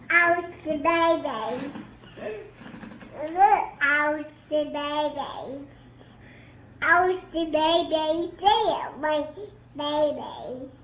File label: owl baby